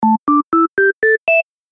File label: synth bell